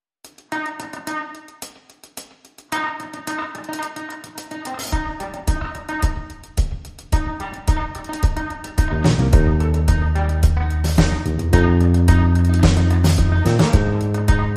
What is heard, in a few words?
Clean Instrumental